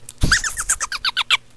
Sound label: laugh; basic games; Hangman